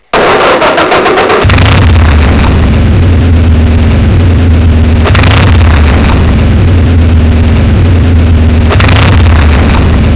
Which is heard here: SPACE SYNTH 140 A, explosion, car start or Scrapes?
car start